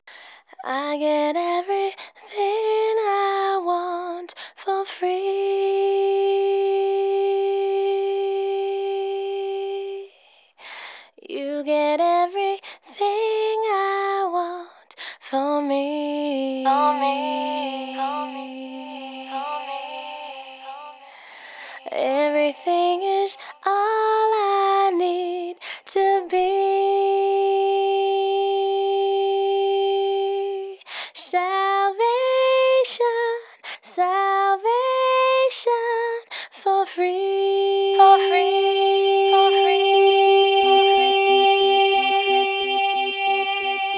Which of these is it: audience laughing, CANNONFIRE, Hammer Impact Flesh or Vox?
Vox